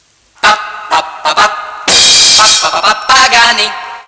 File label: Jingle; Pagani